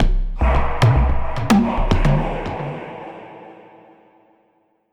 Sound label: basicMood Cueva Bosque